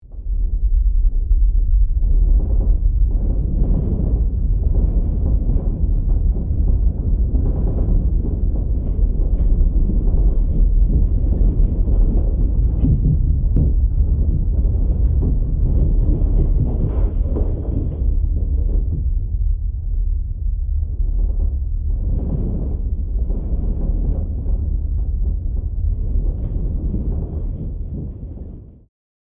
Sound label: Deep earthquake heavy vibration low rumble